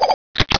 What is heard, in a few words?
cut